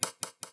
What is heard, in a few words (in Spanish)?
Golpe eco